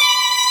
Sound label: violin; osu